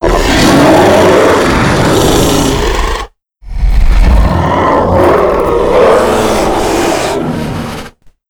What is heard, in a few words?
start; slender; quadralex